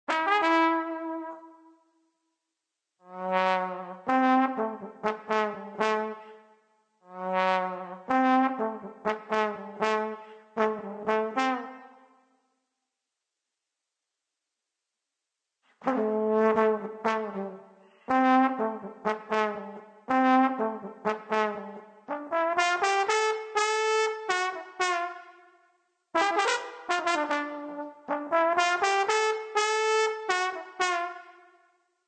Trumpet; Club Penguin Online Unofficial Soundtrack

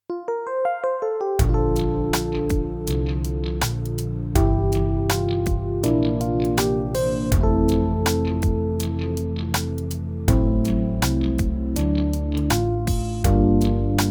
128beats 162bpm

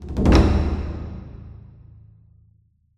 Stinger Taiko

